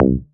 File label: BASS STER.